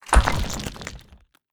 empire game settlement ui wall crumbling stone hit rock fal; debris